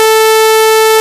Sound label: tooth saw 440